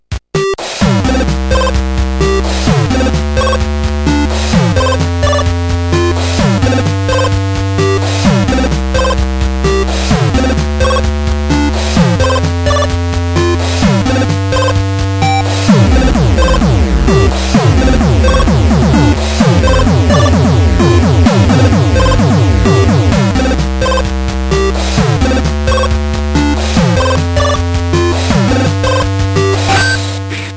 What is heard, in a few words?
8bit bleat